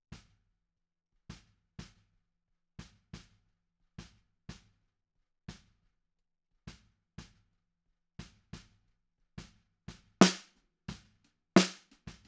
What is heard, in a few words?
Snare Bot